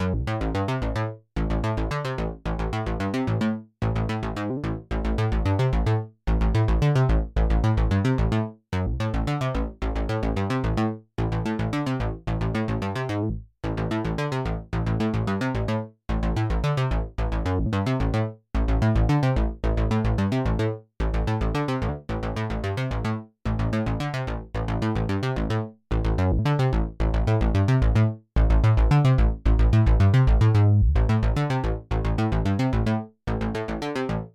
OB6 Factory Preset; portiert auf P6

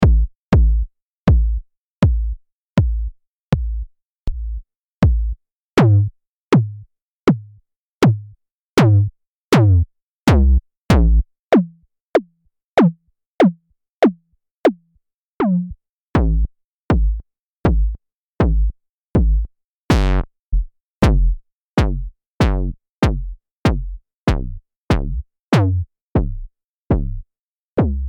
Cascadia Some Kicks; Intellijel

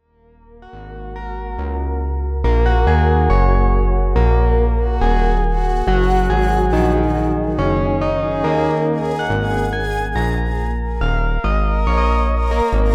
Melbourne Instruments NINA MIX Slow

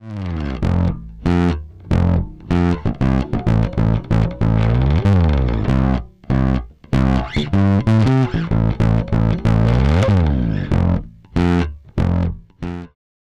BBB Preamp; Zoom B2 Four

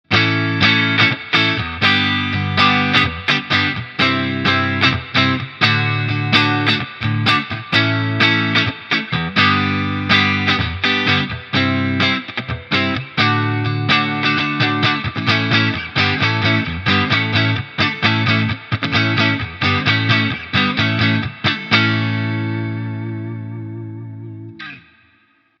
Surfy Industries Surfybear Classic Reverb clean; Tone 7 Mix 3 Dwell 4

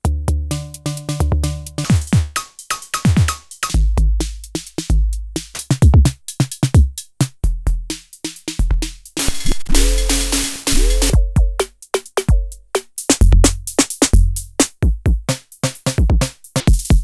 Polyend Tracker PERC Engine

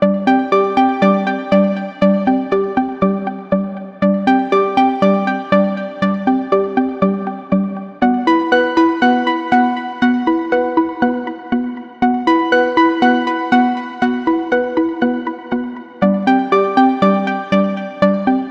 Oberheim OB 1 Ambient Sequence; GForce Software

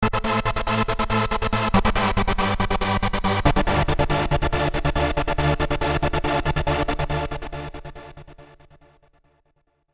Trancegate

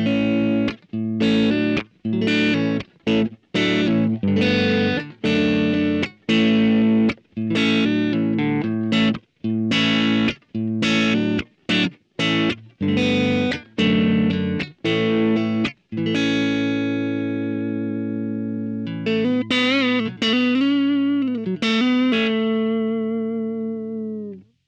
Vox AmPlug Green Mode Nearly Clean

